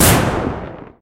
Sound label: attack